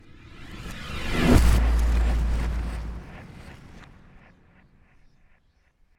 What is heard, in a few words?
Trailer Hit